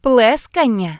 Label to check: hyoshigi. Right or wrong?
Wrong